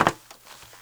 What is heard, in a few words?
METAL, footsteps; AKAI S-Series CD-ROM Sound Library VOL-6